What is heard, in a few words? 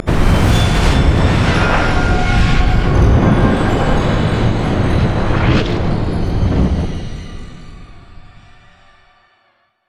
bog crystal; odin